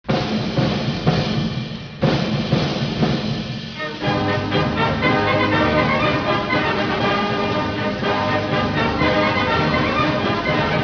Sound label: grenadiers; dod